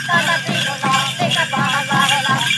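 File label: dwarves dance; 摇钱树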